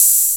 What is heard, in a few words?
HH OP, HH OPEN; AKAI; USB Soundscan vol.20 - Fresh Disco House I